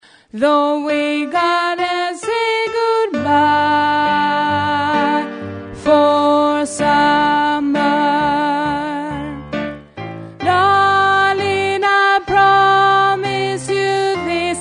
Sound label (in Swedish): alt, stammor